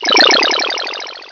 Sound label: bubbles